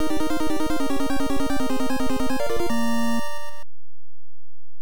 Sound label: Game Clear; nes